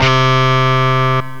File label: distortion guitar; gmplay